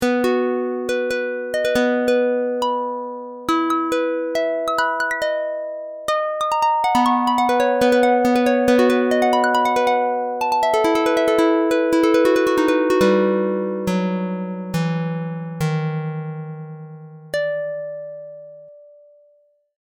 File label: instrumental